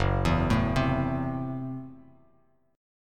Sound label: Gb Minor 7th Flat 5th, Bass Standard; arpeggiated; JGuitar